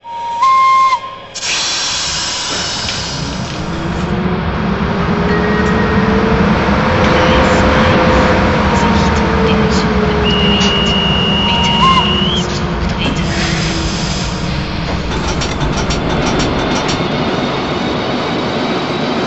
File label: Märklin Sound, Elektrolok Re 421; rheingold lackierung; mfx; dcc; h0